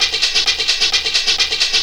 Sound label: 130 addon, DRUMLOOPS, HIHAT